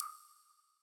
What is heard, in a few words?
click enter